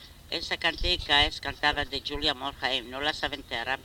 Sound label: Noche de Shabat female voice